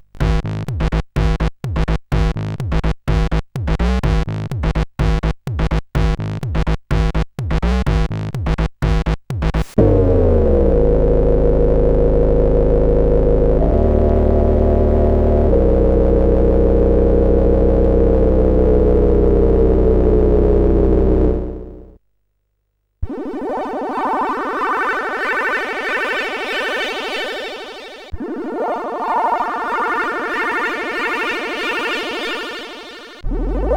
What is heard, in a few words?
sid, CSG8580; hvsc